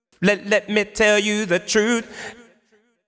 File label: deep house male, 125, A, vocals